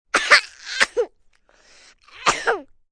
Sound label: Cough Baby, Cough, 1 child, choking, clearing throat, human voice, kid; Sick (Sound Effects:Human); VoiceBox